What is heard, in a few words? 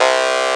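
synthesizer; Best Service Gigapack 1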